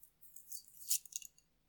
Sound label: watchs